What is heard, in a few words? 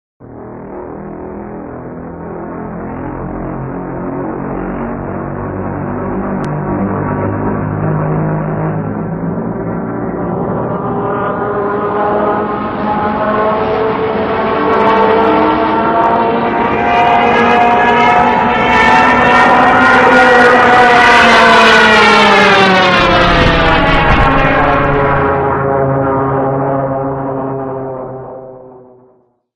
airplane dive